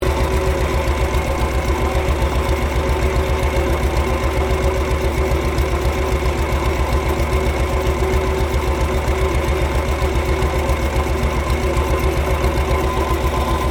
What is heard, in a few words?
Engine Construction roller MCC operating loop, Classic industrial machinery; 1960s; Motors; Machines